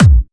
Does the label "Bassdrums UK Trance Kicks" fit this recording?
Yes